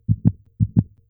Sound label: fast beat